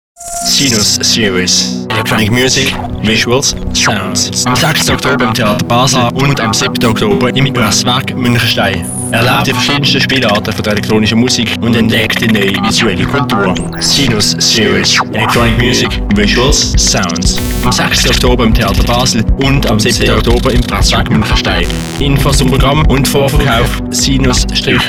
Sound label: sinus; trailer